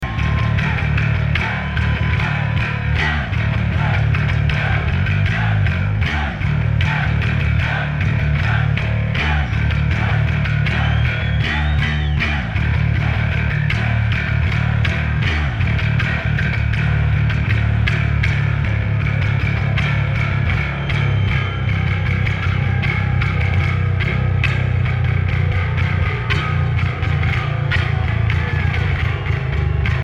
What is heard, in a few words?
Bass Solo